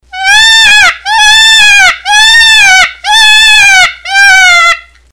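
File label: mountain hare; belyak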